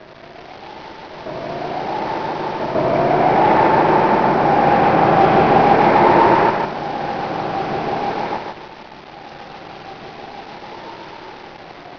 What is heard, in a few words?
waves of wind